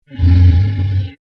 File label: Monster Groan, Horror, Monster, Groan, Creature; Monsters